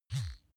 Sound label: razor smooth single